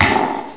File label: HC-2 Clap, Boss HC-2; 300 Drum Machines